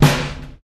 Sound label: subtle reverb snare drum c sharp key; snare drum samples